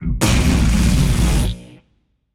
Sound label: tongue, voicepack; smite; jorm